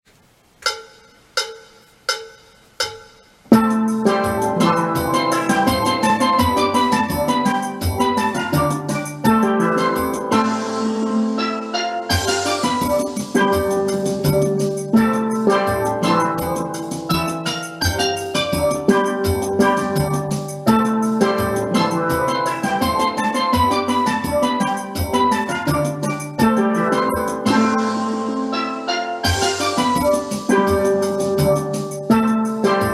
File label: GUITAR PHRASES TUTTI